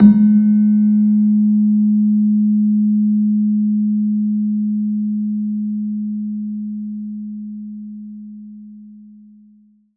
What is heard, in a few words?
PELOG G#1, PELOG BASS; Soundscan vol.60; AKAI